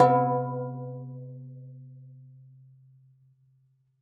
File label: low bell; Univers Sons - Raricussions